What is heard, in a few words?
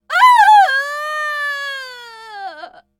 Enemy Generic Human Death Falling